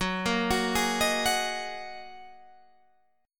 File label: F sharp 6th Add 9th F sharp Guitar Standard; F#6add9 arpeggiated